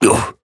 pain small; Operative

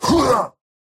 effort melee small; Slork

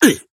pain small

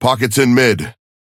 ping synth in mid

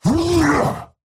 effort melee small; Slork

